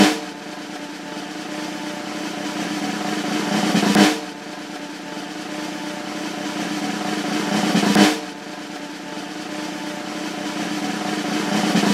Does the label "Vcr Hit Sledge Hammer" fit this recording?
No